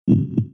heartbeat sound; gamerip; Arc of Templar

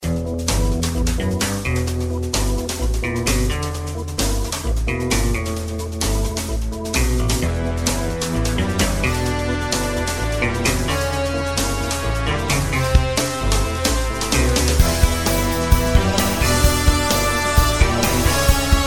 Music Menu; Diabolik The Original Sin; PS2; PSP; Wii

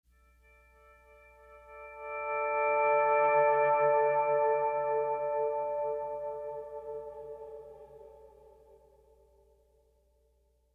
Pleasant Guitar; The Last of Us; ML Pleasant Guitar Mood

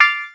zinger; Zombie Rollerz Pinball Heroes

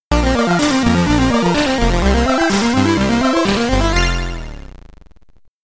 Get Ready! (SID Stereo); Red Max; commodore 64

